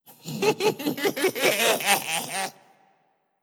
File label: Mirro Laugh